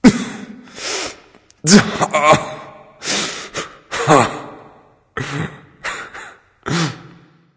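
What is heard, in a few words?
non-verbal boohoo; smite; voicepack; houyi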